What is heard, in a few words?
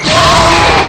lazer; evilmanfred; pyron